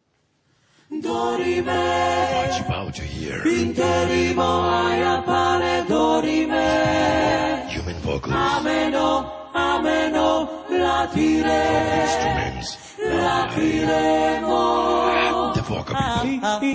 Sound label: Vocal